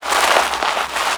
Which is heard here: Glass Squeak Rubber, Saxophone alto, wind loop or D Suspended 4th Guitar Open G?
wind loop